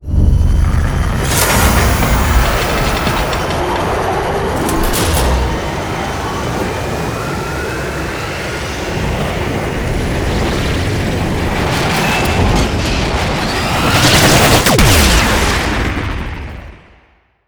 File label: Extraction Cin SFX; Warframe; Awakening Remaster; Cinematics